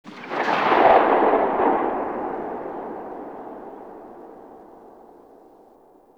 Cannon Tail; Grineer

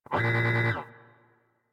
Research Facil Repair Robot Lift; Warframe; Ambience; Venus Open World; Objective Rooms; Props One Offs

